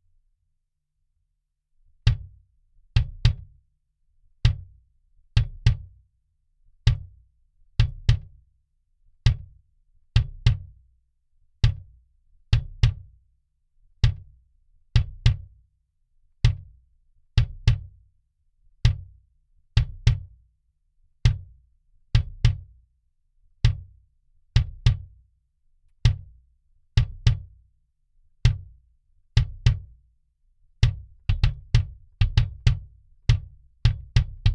d112 comp & eq

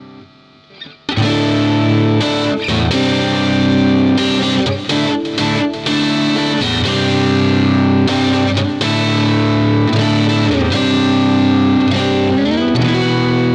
zoom g3x 410 blss solo

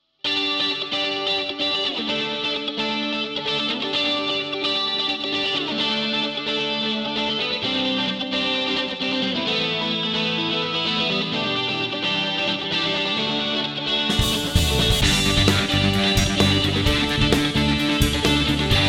zoom g3x song

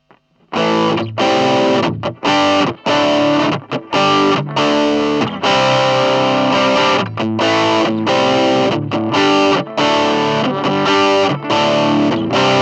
drive distr; Clean test; MusicMax

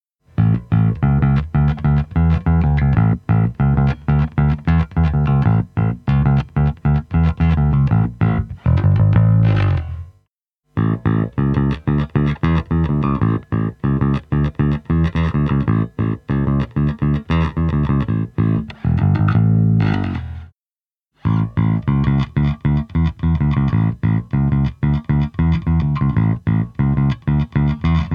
jbf-1000 fingers, BULLDOG JBF-1000; Modern Jazz Bass

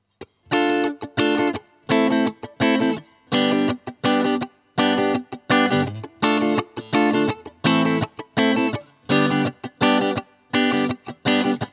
jc clean riff; Cube 40XL

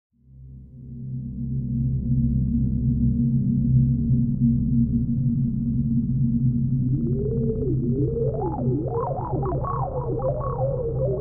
casio xw-p1 solo synth

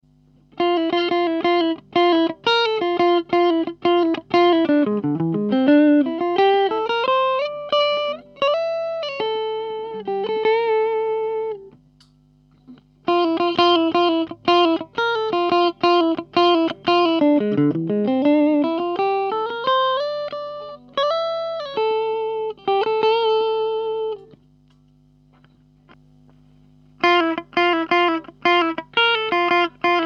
clean solo; Neck, N+B, Bridge

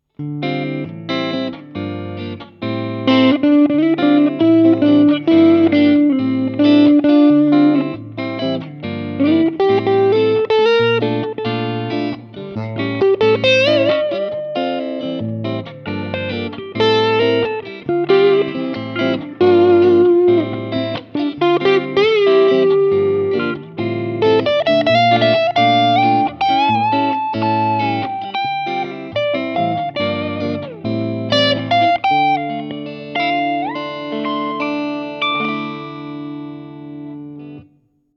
clean; Sterling by MusicMan; Music Man Axis

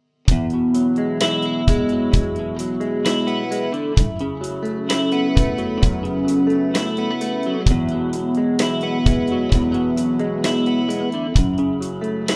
DBZ Barchetta ST-FR

